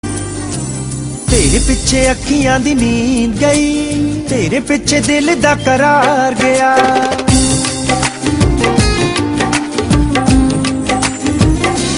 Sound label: punjabi ringtone